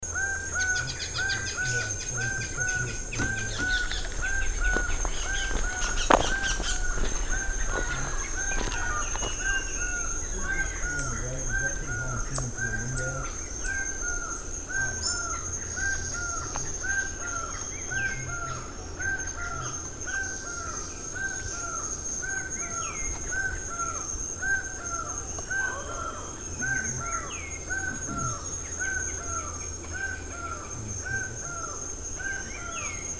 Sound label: Laughing Falcon, Panama